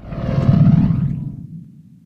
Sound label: sentry laser pass, weapons; tf